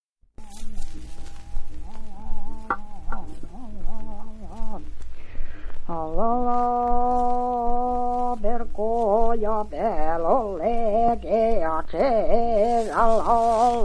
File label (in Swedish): Arjeplog jojkar ekorren; folkminnen